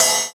Cymbal, JUNGLE BREAKS DRUM SOUNDS

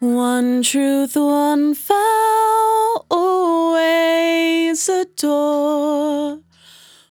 100bpm Bm, Velvet Vocal; Zero-G

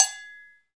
CowBells; Club-50 - Foundations Roland; CowBel+Metl

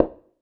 perc organic muted

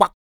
KUK, MALE PERC

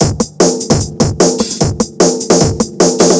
150 Phat Club Drumfill, 150bpm; Combi Loops; Nu Dance Electro

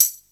tambourin

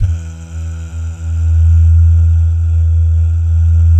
DAH, DAHS GUYS; Spectrasonics; Vocal Planet; Jazz FX

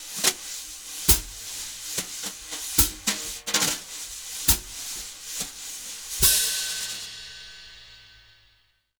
BALLAD, Jazz Latin Drumloops

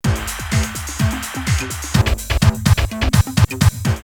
drumloops Rave 126; X-Static Goldmine; 90 s Sample CDs

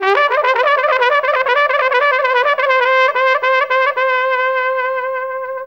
ORIENT TRUMP; Best Service; World Colours

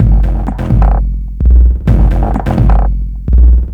ELECTRO SET; Bass; Sonic Foundry (Sony Creative Software); Not Just Another Pretty Bass

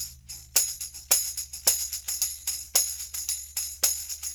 tam; Percussion

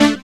SYN TONE; Sound & Vision - Gigapack II

